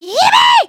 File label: celebrate